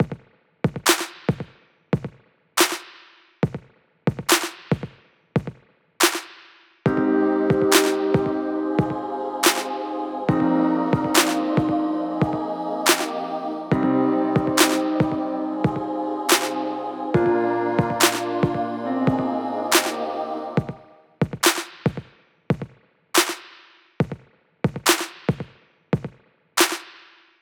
A very short loop that could be used for credits or a game over